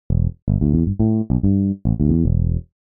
finger Bass

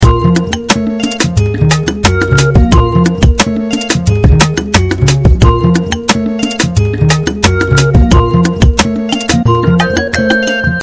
89bpm

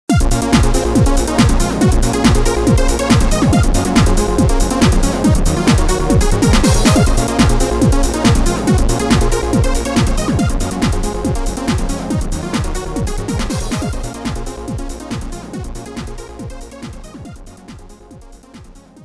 Dream Trance

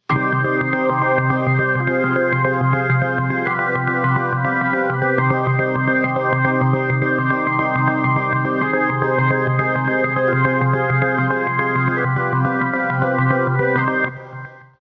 chopped organ; GFXCHOPPER

